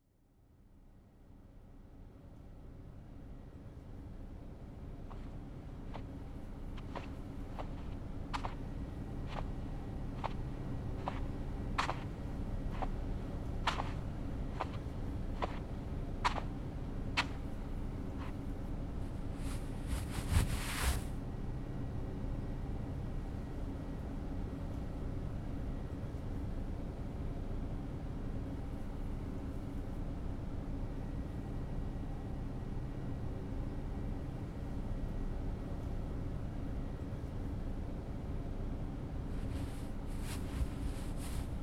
耳かきしてみた（綿棒・右耳）, ASMR; 地球の流行り・耳かきしてみた

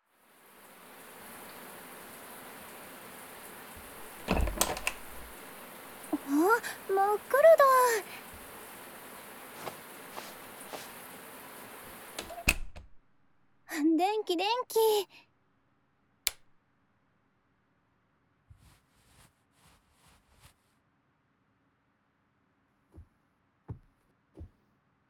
眼球 たっぷり マッサージ

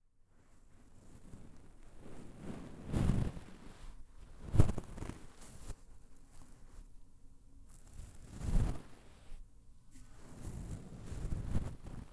化粧ブラシ

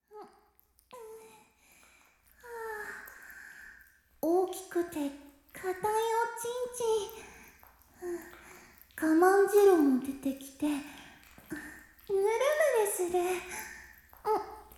お風呂でエッチ（耳舐めシーン）; 妹は恋人～お兄ちゃんの精子いっぱいちょうだい～; Sound Carnaval

